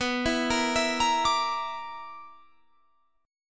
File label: B-Minor Major 7th Double Flat 5th B down